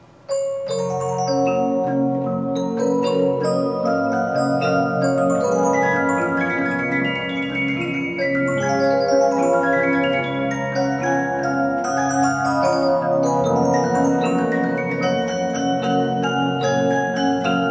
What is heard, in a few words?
Regina music box short bedplate